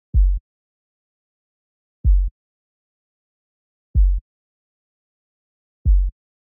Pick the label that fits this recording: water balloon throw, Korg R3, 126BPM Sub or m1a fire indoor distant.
126BPM Sub